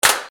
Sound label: Percussion, EuroDance; Best Service; Ethnic Journey Sound Cube; EuroDance Essentials